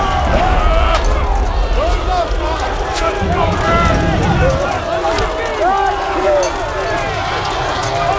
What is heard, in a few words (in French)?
abordage; Pirates Of The Caribbean